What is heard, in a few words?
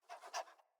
Puppy Idle Pant Short, Vocals; Kubrow